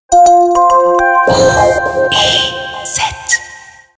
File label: Soundlogo